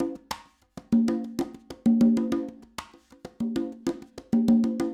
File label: Conga, acoustic percussion loop; Drums & Percussion; Percussion Loops